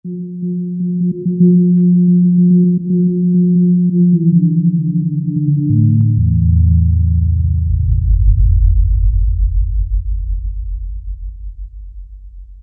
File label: MALLETS - GONG BESAR; Spectrasonics Heart Of Asia